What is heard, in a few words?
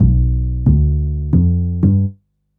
Upright Synth Bass, Drum & Bass; Fluid Dynamics